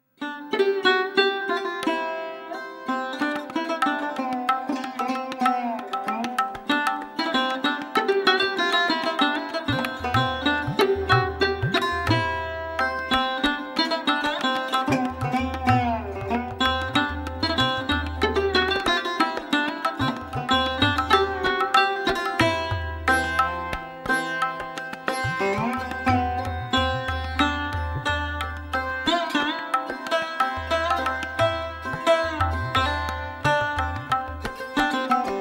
Raga

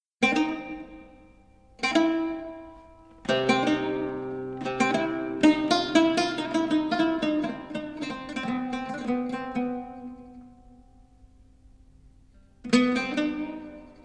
Taqsîm awj

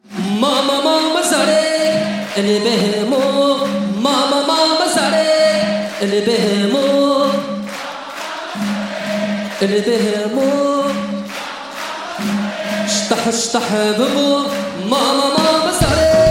Rai

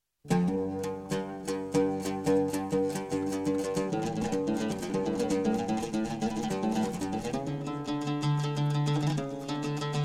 oud taksim; Al-andalus

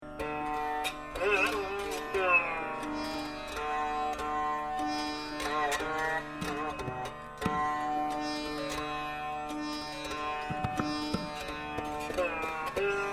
Teental gat

